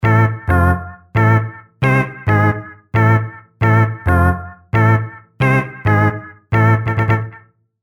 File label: A (134BPM)